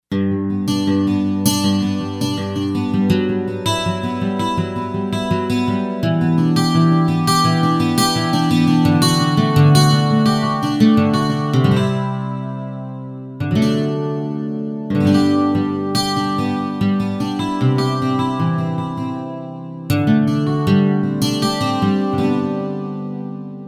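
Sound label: Acoustic Steel Guitar; Roland SC-880; Factory Patches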